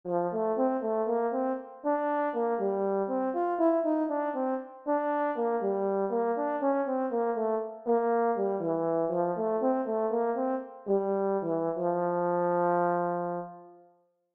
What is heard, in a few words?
horn